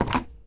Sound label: Pickup Wood Picture Frame Grab; Pickups